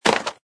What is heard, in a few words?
wood stone